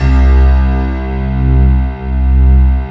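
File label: stacked C2, piano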